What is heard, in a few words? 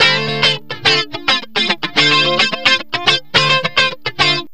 long train